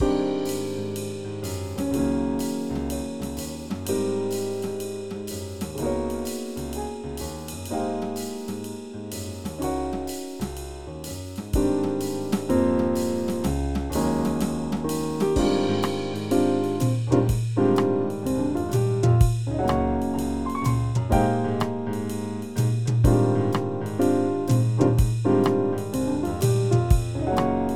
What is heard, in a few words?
Piano and Drums Jazz Loop